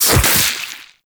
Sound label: Infested Splitter Gore Spew; Warframe; Grey Strain